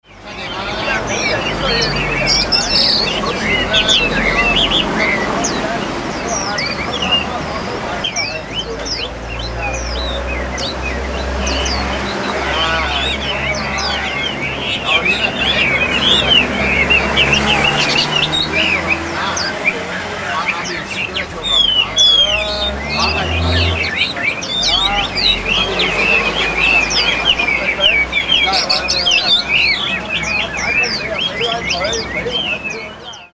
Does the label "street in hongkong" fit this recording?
Yes